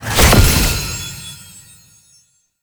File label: Brimstone Rune Pick Up; Roathe; Warframe; Tau; Abilities